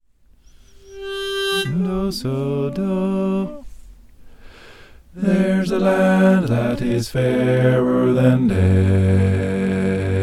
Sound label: bass